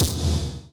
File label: dream damage